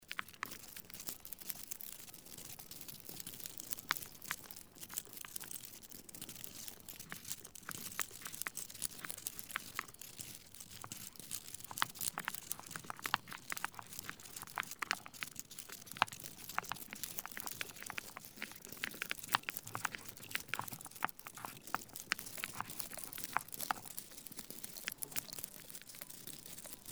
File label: ambient texture